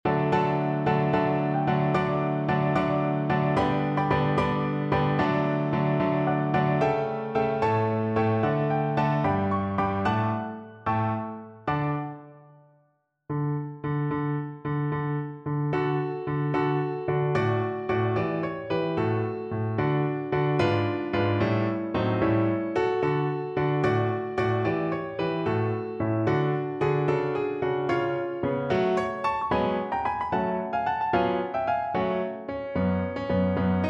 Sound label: pirate king piano